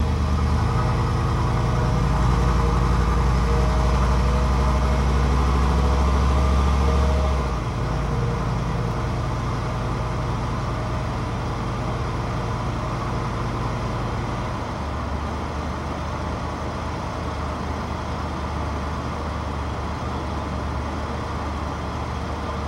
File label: Cadillac 1990 Exterior Steady Slow